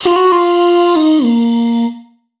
phrase Bbm, Vocal; Slate Digital Omnivox Vocal Sample Pack

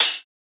hat widest, drum one shots; Drum Sample Pack; Hats; Slate; SEISMIC

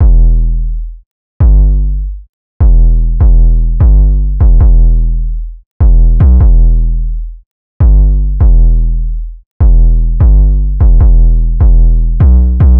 808 loop 150 Em; Slate Digital BASSHOLE Sample Pack

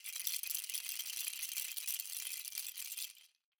rattles Roll, Raw Perc One Shots; Slate; SD SEISMIC Drum Sample Pack

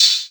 hat faded, Open Hat; Hip-Hop; Drum One Shots; SD CAICOS Hip-Hop Sample Pack; Slate

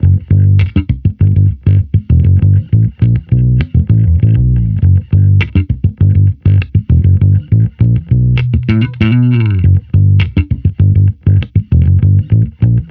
Amped loop 100 Bm, Electric Bass Loops; Slate Digital BASSHOLE Sample Pack

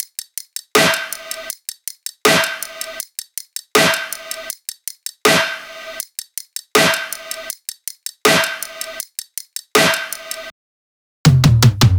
tops 160, Drum Sample Pack Loops Top Loops; SD SEISMIC; Slate

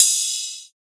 cymbal squeeze; Drum One Shots; SD MIDNIGHT Sample Pack; Slate

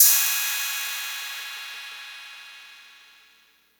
Cymbal Sneaky, 909; Slate; Vintage Drum Machines Volume 3 909 Edition

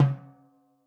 timbales Low Center; Raw Perc One Shots; Slate; SD SEISMIC Drum Sample Pack

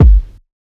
kick fuzzy; Drum Sample Pack; One Shots; Slate; SEISMIC

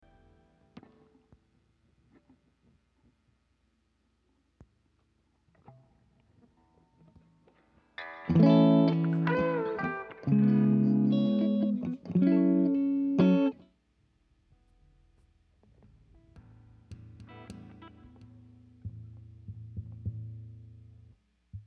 Guitars and Bass

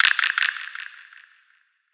perc split screen; one shots; SEISMIC Drum Sample Pack; Slate

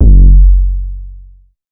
808 one shot F#; Slate Digital BASSHOLE Sample Pack

